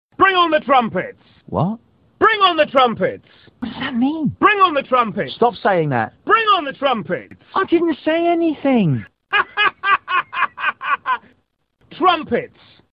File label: Trumpets